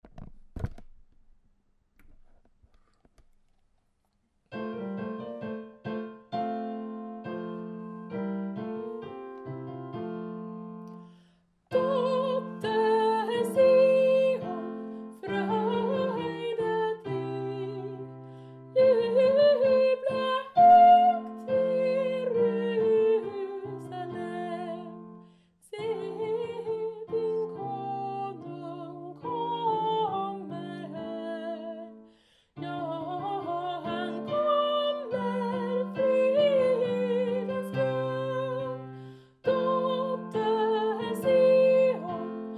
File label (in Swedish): sopran; dotter sion; adventskor